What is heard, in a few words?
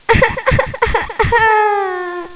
EHEH